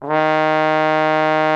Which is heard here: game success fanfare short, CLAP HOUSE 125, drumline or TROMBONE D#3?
TROMBONE D#3